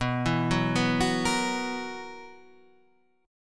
B Major 7th Suspended 4th B down Guitar Standard; BM7sus4; arpeggiated

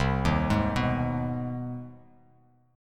C Minor Major 7th Sharp 5th, Bass Standard; CmM7#5 arpeggiated; JGuitar

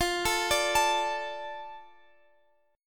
F 6th F down Guitar Standard; arpeggiated; capo 9

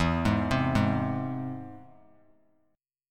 E Augmented; E+ arpeggiated; JGuitar

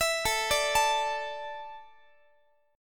A Suspended 4th down Guitar; Asus4; arpeggiated; x,x,19,19,18,20

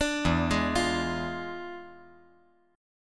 E 7th Flat 9th, chord

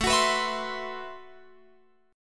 A Minor 6th Add 9th A Guitar Open F; x,16,18,18,19,19; strummed; Am6add9

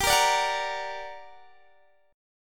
G Minor Major 7th, down, Guitar, Open D

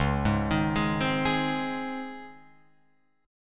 Db Major 7th Suspended 2nd Db Guitar Open F; DbM7sus2 arpeggiated; JGuitar

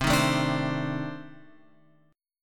C Minor 6th Add 9th C; Cm6add9 Chord {8 6 7 7 X 8} strummed

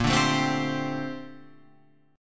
A sharp Major 13th A sharp; A#M13; strummed; Standard; JGuitar

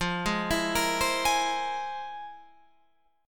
F Minor Major 11th, Guitar, Open F; arpeggiated; JGuitar

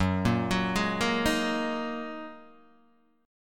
F sharp 6th Add 9th Guitar Open D, chord; 4,1,4,2,1,1

